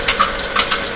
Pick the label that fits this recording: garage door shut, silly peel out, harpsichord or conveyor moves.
conveyor moves